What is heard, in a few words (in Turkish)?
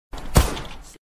telefon bildirim sesi; zula; deagle